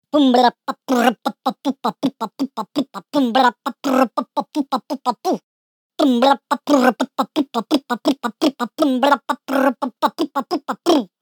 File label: Foolish March